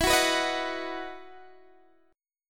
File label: E Major 7th Suspended 2nd, Guitar, DADGAD